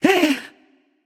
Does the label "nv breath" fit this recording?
Yes